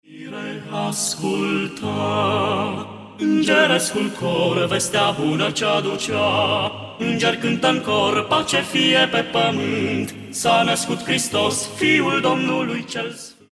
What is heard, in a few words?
Voice Quartet; Intr-un staul mic; craciun